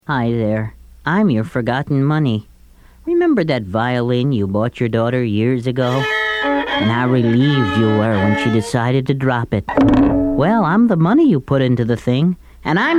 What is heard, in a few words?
Violin; VerbaViolin